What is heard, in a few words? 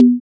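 percussion wood, lofi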